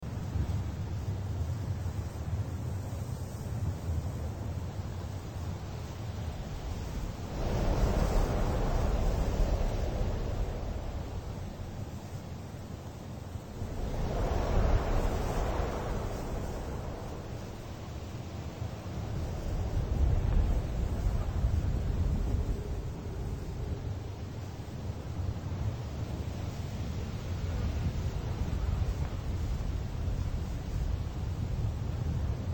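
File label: desert sounds; zvuki pustyni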